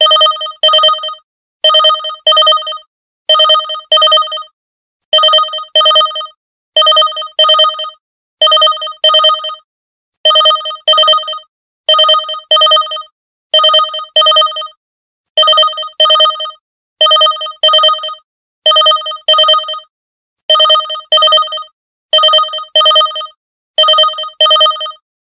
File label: Simple Beep, ringtones